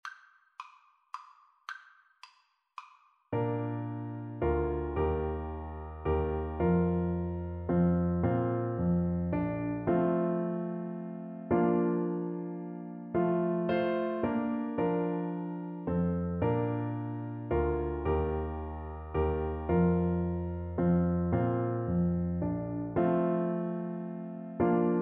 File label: Oboe